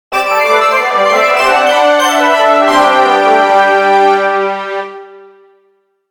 Strings Horns Orchestra, Musical Orchestra Crescendo G Augmented; Orchestral; Blastwave